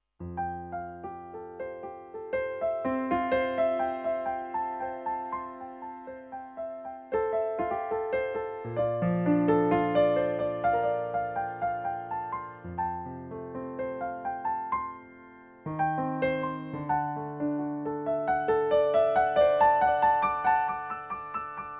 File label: Piano